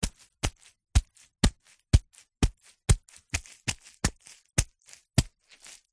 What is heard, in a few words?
foley wet cloth dab squelch sequence